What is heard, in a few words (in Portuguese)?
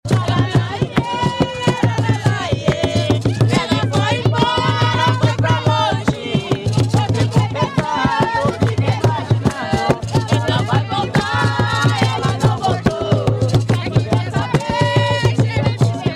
jongo de guaratingueta, jongo; ela foi embora